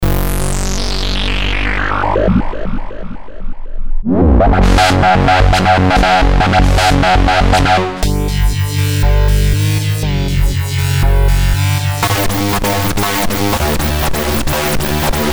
bass; zone

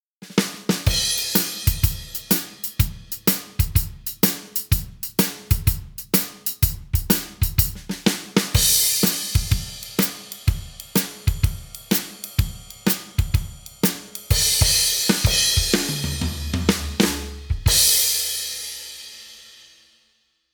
simple shuffle

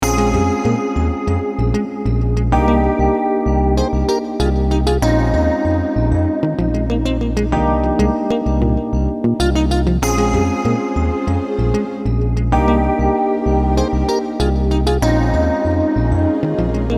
fret mute sustain; fret affair

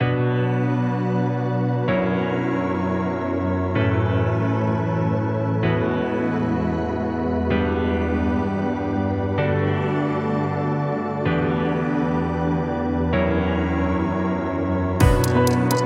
piano

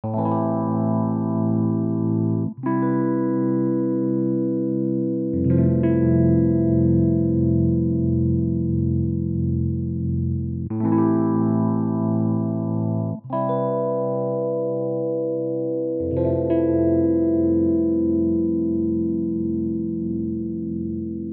am7 epiano 90bpm

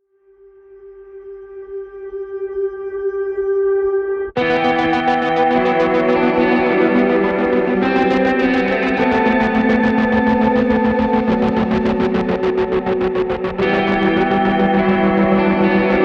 solo

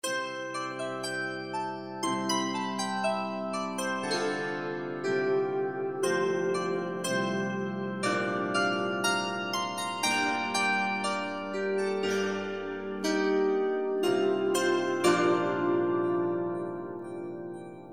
zither

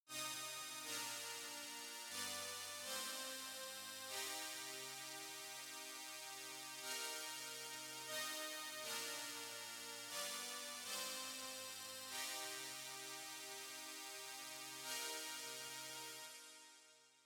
junior pad

